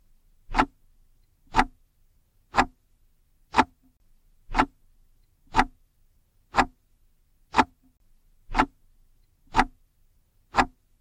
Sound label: clock tick; electric